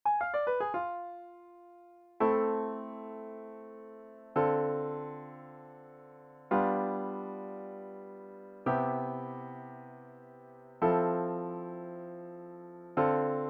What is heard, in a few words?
piano